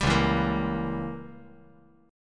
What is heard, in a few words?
Db Diminished 7th Db down; strummed; JGuitar